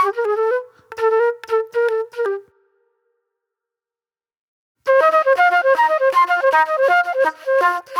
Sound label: Super Soul Flute